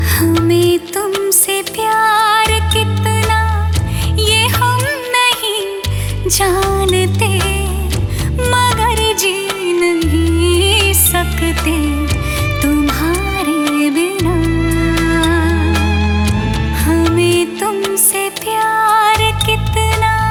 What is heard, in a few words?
song ringtone